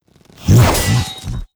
WAR ATTACK

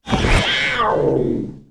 War idle; Mob

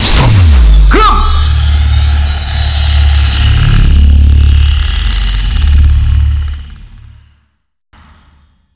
Box big

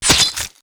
Axe Scale, Damage

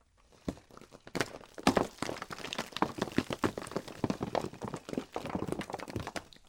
volcano stone, ambience

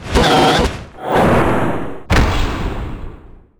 Die, Mob; Draco

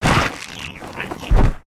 WAR DIE

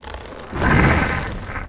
WAR ATTACK; GRAVELAPIS

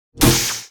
beam axe; Weapon

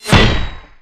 Arrow Metal, Damage

